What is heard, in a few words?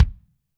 KICK, Drums Percussion